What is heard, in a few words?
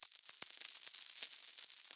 small Win Loop; eggomatic